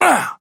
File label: effort melee small; Trapper